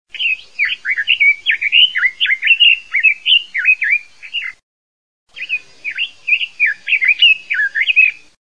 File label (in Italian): USIGNOLO GIAPPONE